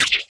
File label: RSS, 160 BPM